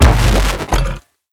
rapid Fissile Accelerator, WEAPONS

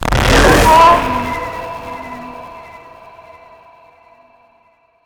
JOVE damage

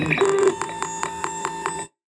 DEFENCE BOT idle

